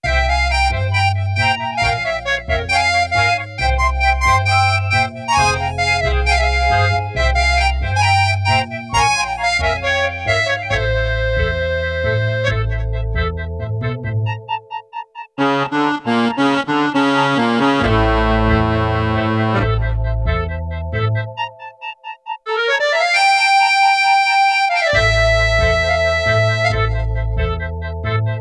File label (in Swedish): judisk jazz